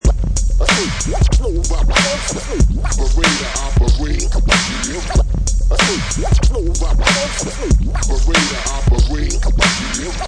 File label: Scratch 94 beat, Vinyl Scratch 94 bpm with beat, Professional killer vinyl scratch on top of hip hop beat; Professional killer vinyl scratch on top of hip hop beat, perfect for sampling, mixing, music production; DJ